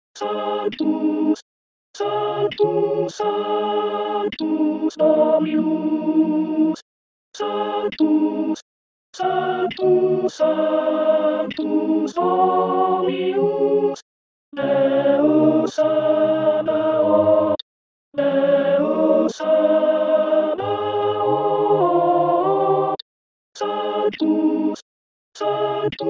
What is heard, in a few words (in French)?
sanctus alto voix missa brevis